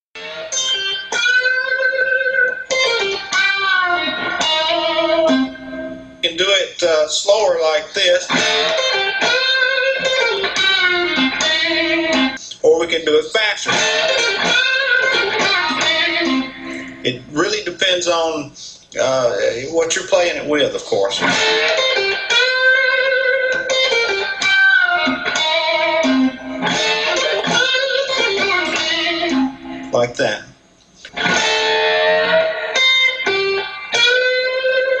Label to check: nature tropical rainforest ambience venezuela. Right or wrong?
Wrong